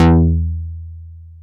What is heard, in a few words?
ANA BS, SYNTH BASS